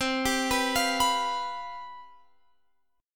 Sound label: C Major 7th Suspended 4th, down, Guitar, Open F; CM7sus4 arpeggiated